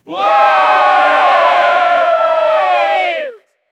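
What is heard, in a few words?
distant, human voices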